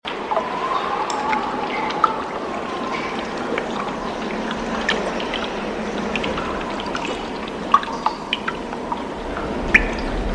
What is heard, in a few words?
wind and water mix